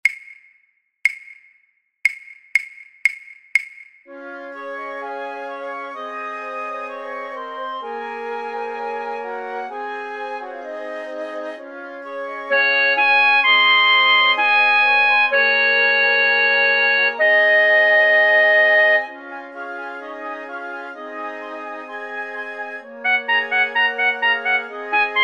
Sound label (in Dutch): fluit 1